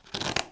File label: Rubbing Scratching Papier Ondule